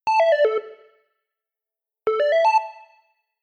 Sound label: Synth Lead Delay Off